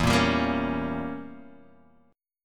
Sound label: E Diminished 7th E, Guitar Open F; Edim7 strummed; JGuitar